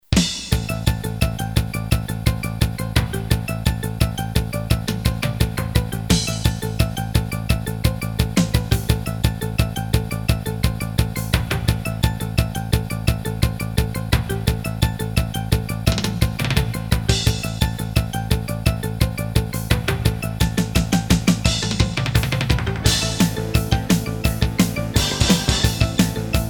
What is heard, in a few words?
Casio GZ-50M; After Burner